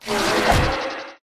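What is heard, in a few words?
pterodactyl boss attack up; pterodaktilj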